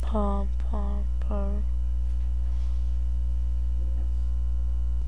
purr

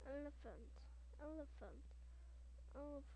elephant

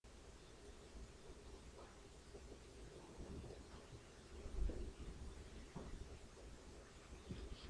knife

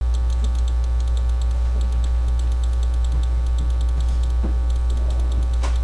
cry

